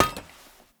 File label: salvaged axe strike; Rust